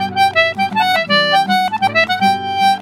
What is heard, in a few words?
Celtic Flavours; USB Soundscan vol.42; AKAI